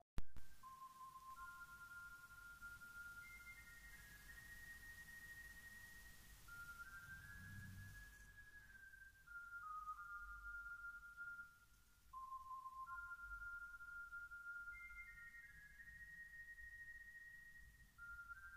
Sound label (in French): Tapion progressif